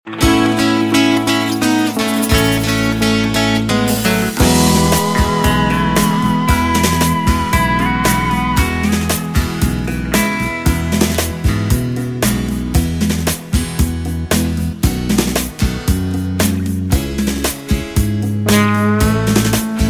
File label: (E) No Backing Vocals